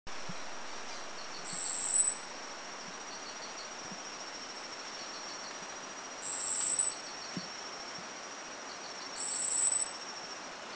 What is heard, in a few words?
Long-tailed Ground Warbler, Philippines; Long-tailed Ground-Warbler Bradypterus caudatus Country endemic; Kitanglad; Few birds heard Kitanglad